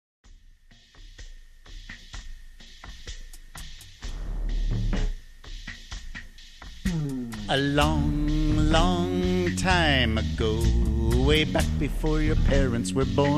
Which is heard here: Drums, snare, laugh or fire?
Drums